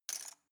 cutlery plastic drawer place

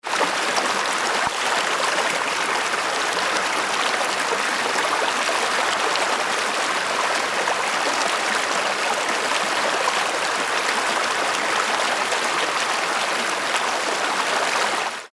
stream medium

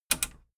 metal button radio click enable fast

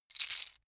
lego bricks handling single

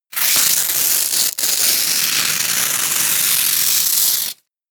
tear

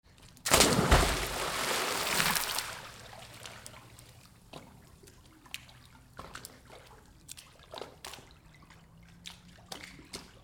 pool dive

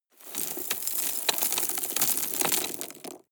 drop rock debris medium

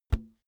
balloon hit hand

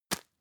tissue tap hard

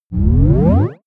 turn on off

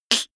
beatbox snare clap

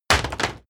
wood pallet drop

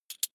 coin big drop soft

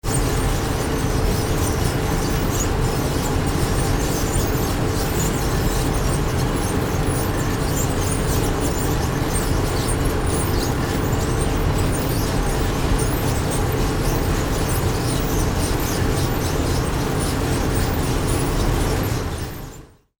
cooling

